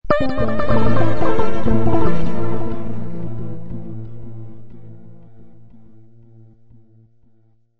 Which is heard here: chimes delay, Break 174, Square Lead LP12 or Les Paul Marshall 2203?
chimes delay